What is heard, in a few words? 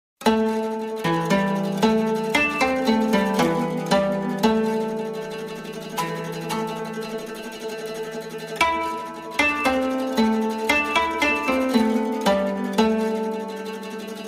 Japanese meditation; مدیتیشن ژاپنی